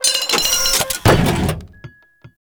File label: loot locker open; Tau; Orokin